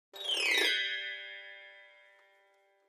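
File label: Grand Piano Strings High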